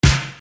Snare Dr

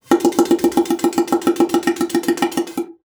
BASSOON; Xsample VOL-6

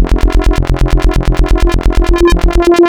Spectral, wavetables; Architecture 32

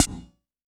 Yamaha CS1x Drums

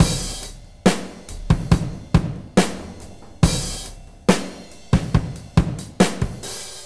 SloMo Beats, Loops 140 BPM

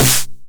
909 SD Tape Sat

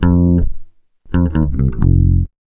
135 F, Bass Grooves; USB Soundscan vol.30; AKAI

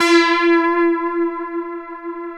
Korg PS3100 G 3; Back In Time Records; Korg Universe VOL-1

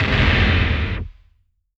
TIGHT, DIST BDRUMS; Zero G; Malice In Wonderland

